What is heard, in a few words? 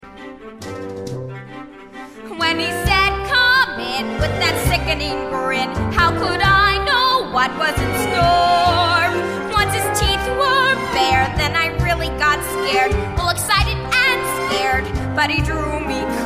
with vocals; audition